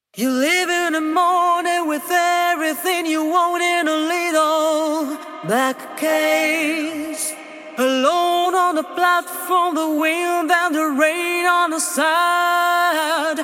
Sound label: Acappella